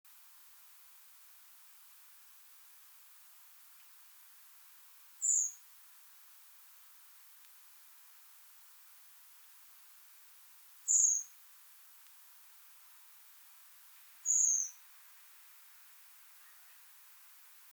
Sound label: Turdus merula; SETE